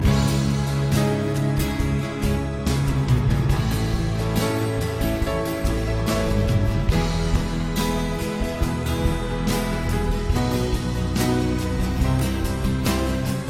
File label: Instrumental